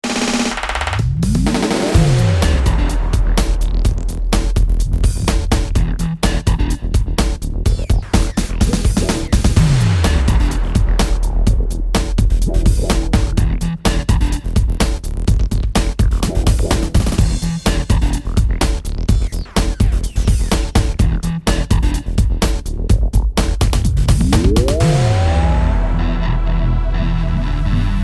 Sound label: fantom x big beat